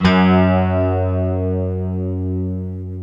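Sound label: GUI, Slow Strings; Optical Media International - Sonic Images Library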